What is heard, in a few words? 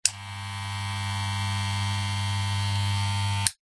household hair clippers on run no cutting short off